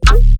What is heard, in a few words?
BASS ONE SHOT GULP